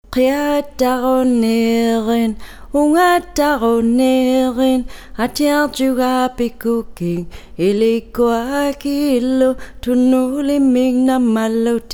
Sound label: Syllabic Song iua; inuktut home literacy manual